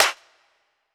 Clap

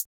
HiHat

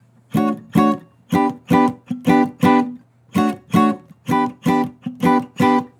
Acoustic Chord Loop - 160 BPM D Maj; Cymatics

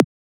Transient; FLPRO

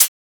Closed Hat; FLPRO

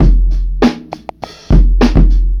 Loop

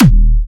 Springer D#4, Big Room Kicks

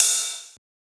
Ride; FLPRO

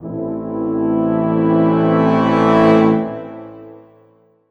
Brass Swell

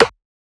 Percussion; FLPRO

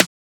Transient; FLPRO

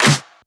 Shifted Clap; FLPRO

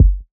Kick

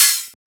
Open Hat; FLPRO

